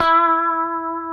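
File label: E 4B, compressed strat, guitars; Spectrasonics; Hans Zimmer Guitars VOL-1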